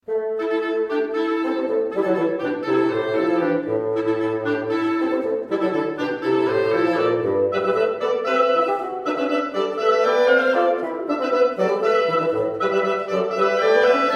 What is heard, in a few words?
Wind Trio